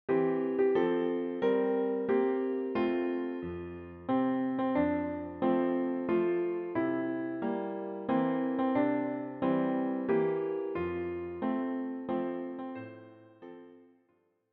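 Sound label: piano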